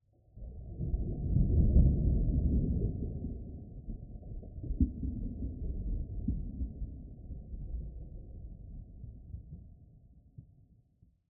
plode, basalt deltas, nether, ambient; minecraft

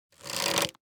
quick charge, crossbow; minecraft

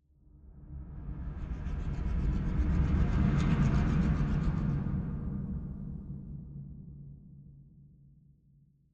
active, ambient, nether, basalt deltas; minecraft

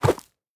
jump, goat; mob; minecraft

